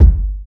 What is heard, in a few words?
boom steel kick drum sound g key